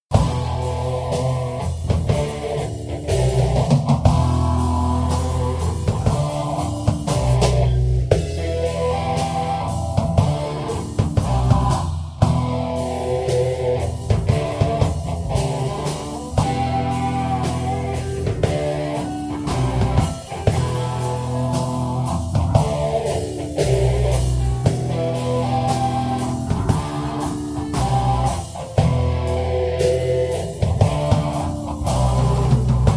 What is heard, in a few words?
atomic phaser